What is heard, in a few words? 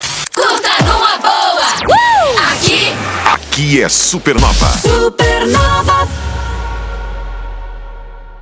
INTERCOM